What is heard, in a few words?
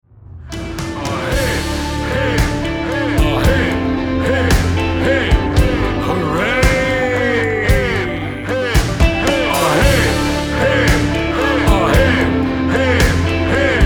hiphiphooray